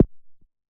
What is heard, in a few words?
hard footstep